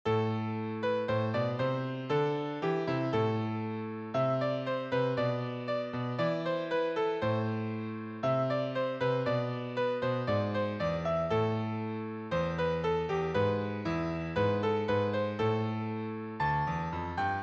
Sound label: Cello